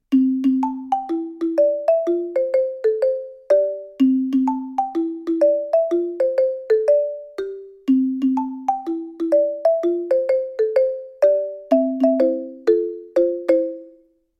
AX 1000 - mit zwei Schlägeln; studio-49

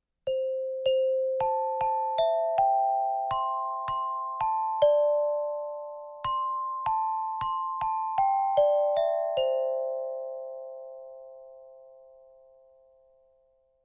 AG 500 mit einem Schlägel; studio-49

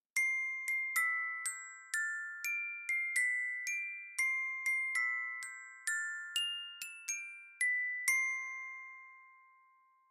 GSd - mit zwei Schlägeln; studio-49

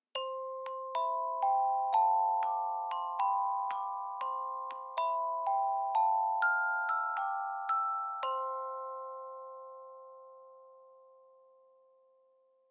GAd - mit zwei Schlägeln; studio-49

